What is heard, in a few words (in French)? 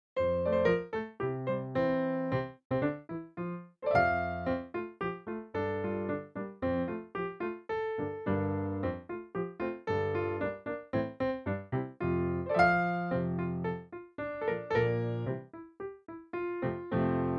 la grenouille instru